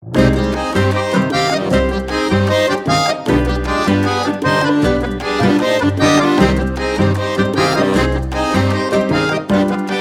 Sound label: Tango with Angels, Accordion; Composer Ilya Efimov; Efimov Audio